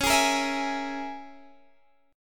C sharp Minor 6th C sharp, Guitar Open D

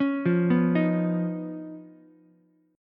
D sharp 7th Suspended 2nd Sharp 5th Ukulele; arpeggiated; JGuitar

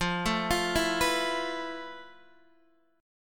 F Major Sharp 11th, Guitar DADGAD

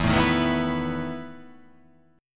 E Major 7th Suspended 4th

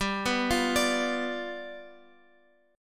G 7th G down Guitar Open F; G7 arpeggiated

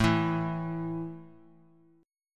A 5th A down, chord; strummed

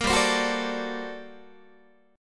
G Minor Major 11th G down Guitar, GmM11 chord; GmM11 strummed; 13,15,15,16,13,13; JGuitar

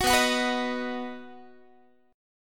B Major B, Guitar; strummed; 21,19,21,21,19,x

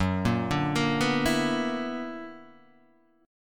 B-Minor Major 11th

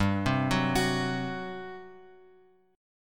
G Major 7th Suspended 4th G; GM7sus4 Chord {3 3 4 X X 2} arpeggiated

